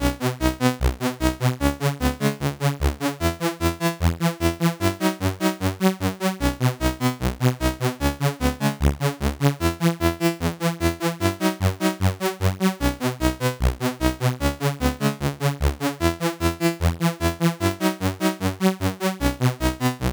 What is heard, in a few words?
c64 in game; lazy jones